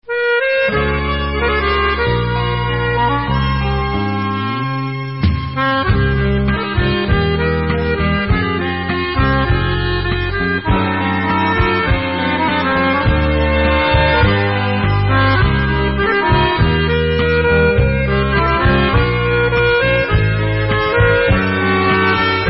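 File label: Instrumental, Israeli Dances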